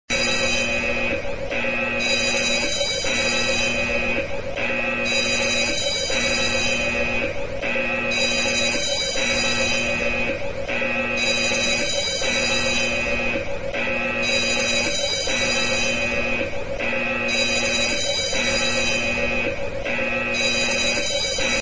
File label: Alarm Sound